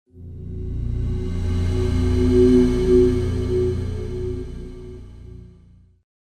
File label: Transition; Train